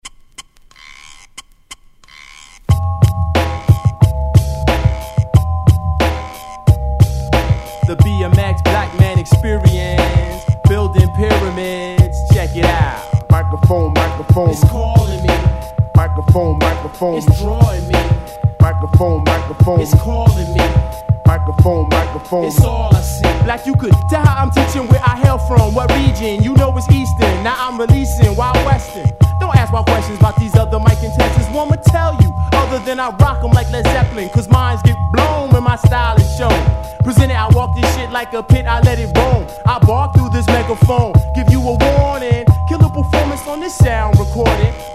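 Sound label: hip hop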